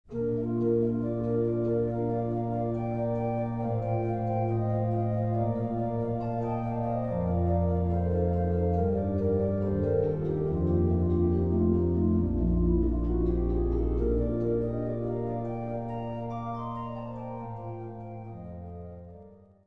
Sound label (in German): floeten, orgel